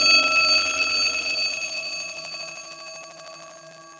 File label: Phone ring; FREE SFX PACK VOL 1